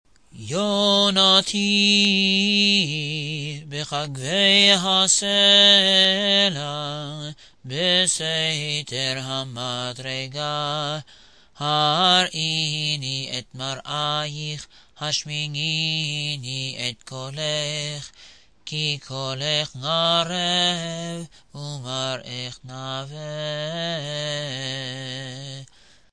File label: Song of Songs Chapter 2 v 14 C Major